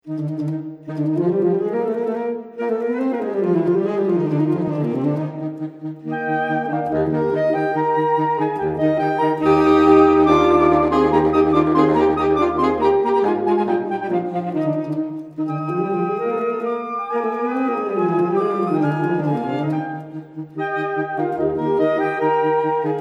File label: 4 farben sax; Vierfarben Saxophon